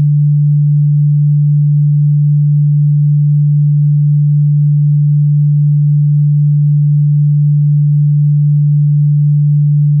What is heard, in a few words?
d tone